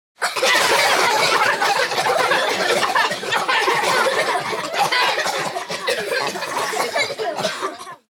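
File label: mass children's coughing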